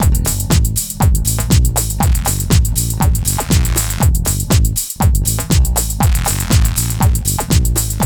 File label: GHET ROL